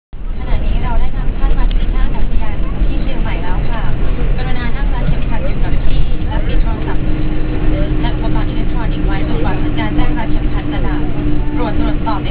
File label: arrive, Chiang Mai; Welcome to Chiang Mai; thai cambodia